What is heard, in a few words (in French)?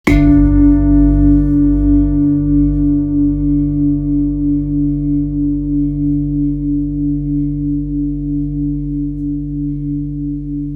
Bol grave re 8.05kg; tara blanche